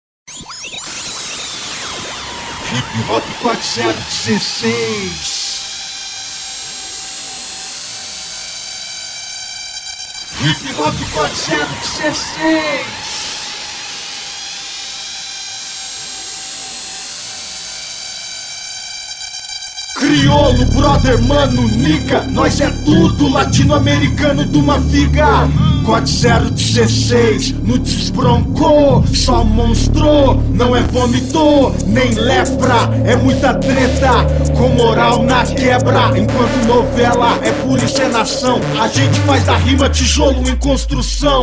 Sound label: hip hop